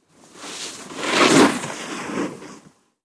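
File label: snowboard sounds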